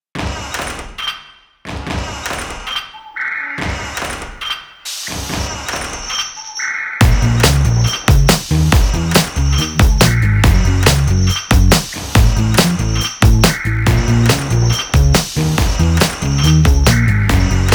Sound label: Creepy Beat